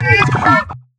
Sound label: Tumble